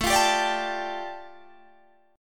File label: A 7th Suspended 4th Sharp 5th A Guitar Standard, chord; strummed